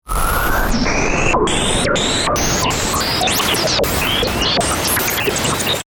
Stinger Stutter, Production, Element, Stinger, Stutter, Static; BlastWave